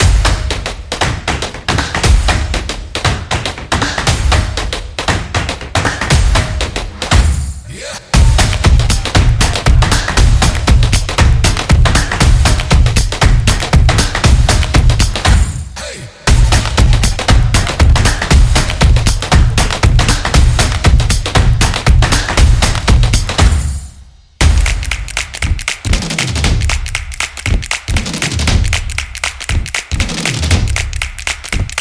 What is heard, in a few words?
Drumbeat